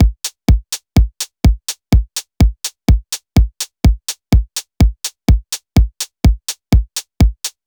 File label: Electro loop; Reference Beat